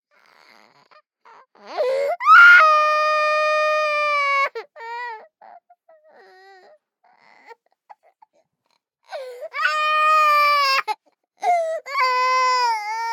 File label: BABIES CRY Girl 24 months Heavy Long; Baby Boom